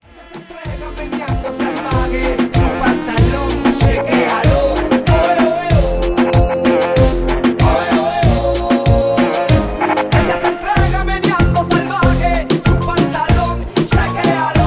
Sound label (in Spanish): cuando baila reggaeton